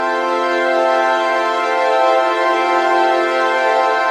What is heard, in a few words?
off world strings loop; ohms-law; potential energy